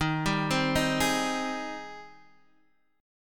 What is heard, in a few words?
G sharp Major 7th D sharp